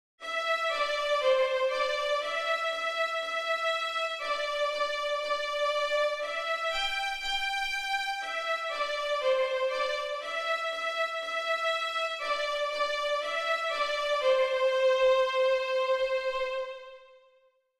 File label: Timbre Violin Melody